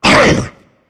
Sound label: charger pummel; player; voice; attack; source engine; left 4 dead 2